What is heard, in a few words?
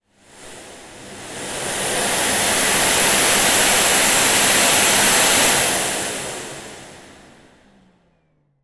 household vacuum cleaner hose nozzle close up on off short; zapsplat